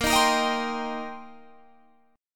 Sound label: C Minor 7th Bb